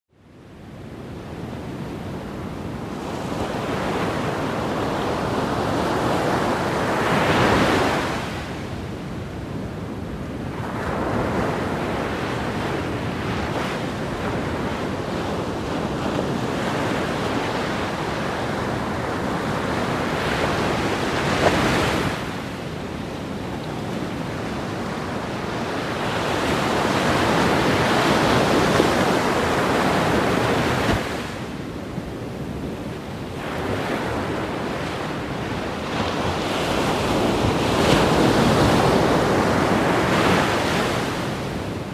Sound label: surf beach shorelines; andrew skeoch